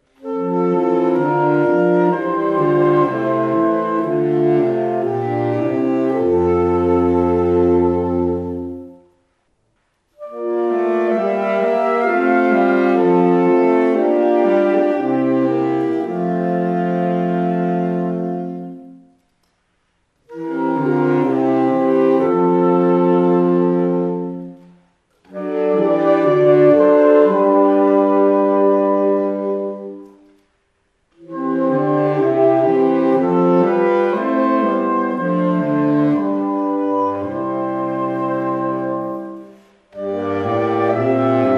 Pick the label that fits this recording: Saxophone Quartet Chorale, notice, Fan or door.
Saxophone Quartet Chorale